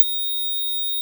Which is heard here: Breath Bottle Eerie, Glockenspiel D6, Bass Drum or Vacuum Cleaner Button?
Glockenspiel D6